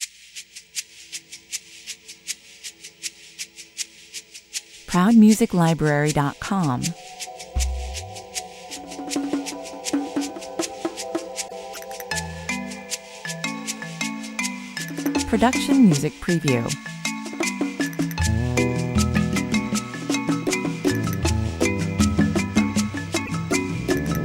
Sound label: marimba